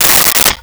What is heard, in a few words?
Bowl Remove from Cupboard; Kitchen; 1001 Sound Effects